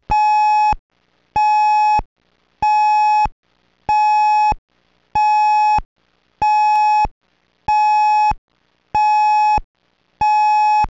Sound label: pulse, siren; emergency